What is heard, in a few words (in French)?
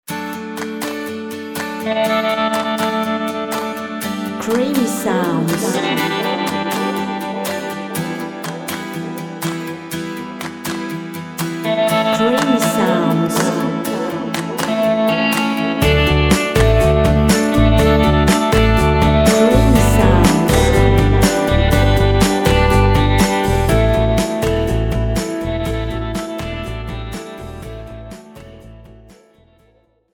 creation musicale folk claps